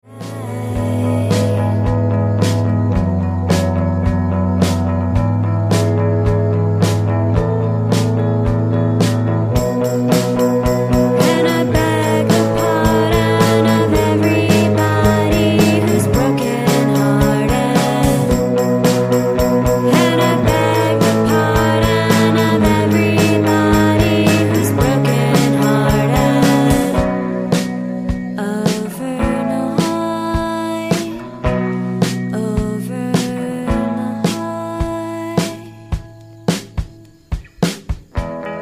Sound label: drum